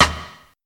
old school small reverb snare one shot d sharp key